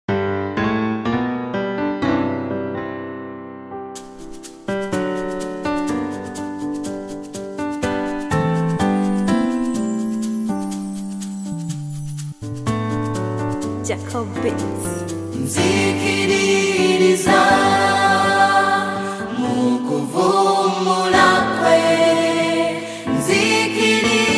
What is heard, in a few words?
Choir; Stream Of Life Choir; Ondetede